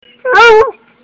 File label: Hound yelp